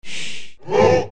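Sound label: exclamation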